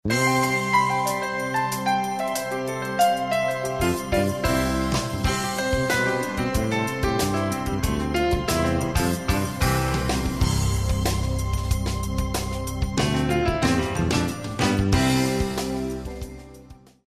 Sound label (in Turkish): santral fon muzikleri